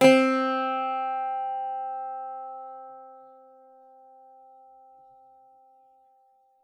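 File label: bouzouki C4; SoundBytes; Early Patches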